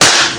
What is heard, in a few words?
the smack